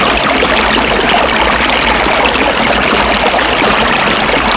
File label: stream; PC Gamer IT CD 41; Daikatana